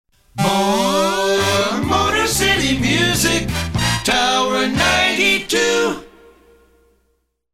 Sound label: JINGLE More Motor City Music; WTWR-FM